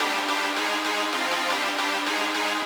lead

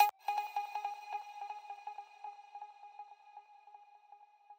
arp

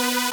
synth

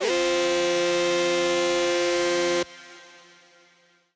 chord

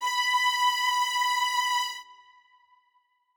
strings

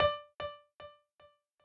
piano sabi

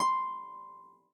harp

